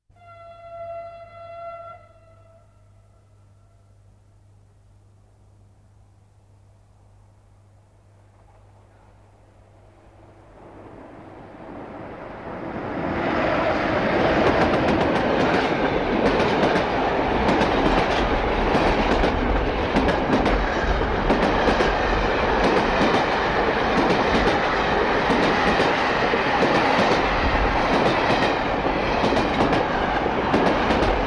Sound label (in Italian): TRENO FISCHIO E PASSAGGIO VELOCE; trasporti; treni